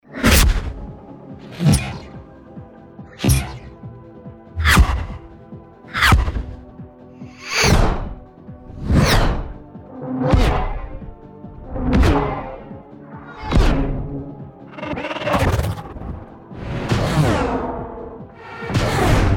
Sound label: Whoosh Cinematic; Cartoon Game Sound 2.0; JBoB Sound Pack Demo